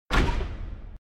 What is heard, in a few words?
Chest; slots; Realm Saga